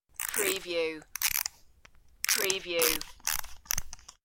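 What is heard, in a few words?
Wind Up Toy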